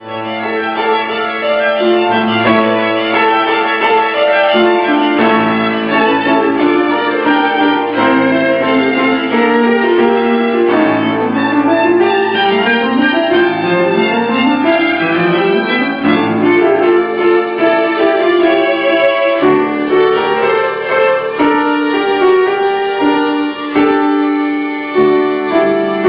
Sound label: Vivaldi grosso